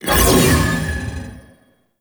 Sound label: Bounce Activate; Trapper